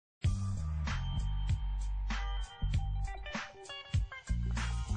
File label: mission passed; gta